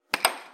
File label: air hockey hit; arcade